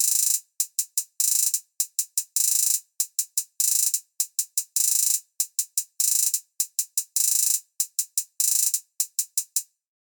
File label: Hihat